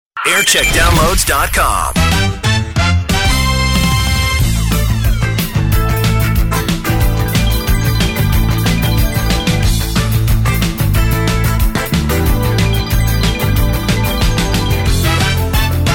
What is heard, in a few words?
Chart Show Bed; Radio 1; JAM